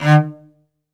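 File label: solo cello, strings; ILIO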